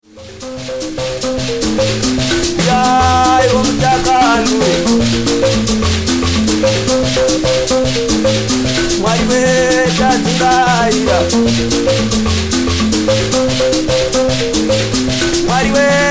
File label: mbira